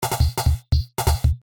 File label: Drums; D n B